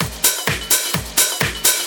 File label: Discohouse Loop 128 BPM